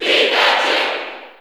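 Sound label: Cheer English, crowd; Pikachu; SSB4; SSBU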